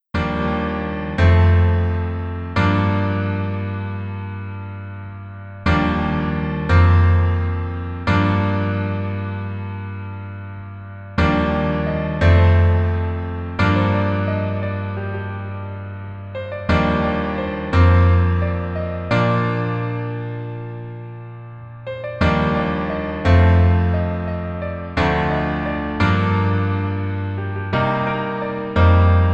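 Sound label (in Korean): Piano version 멜로디 MR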